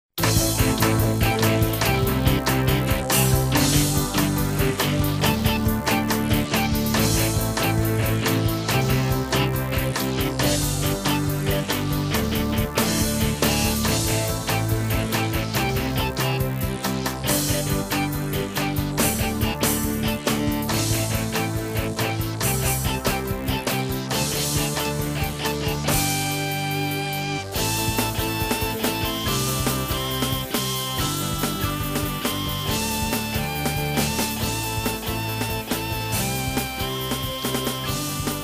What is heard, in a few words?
guitar